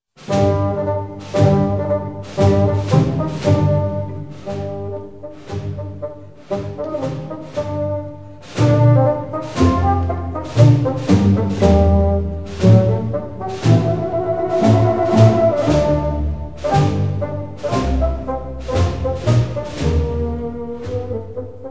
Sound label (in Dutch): fragment met de kleine trom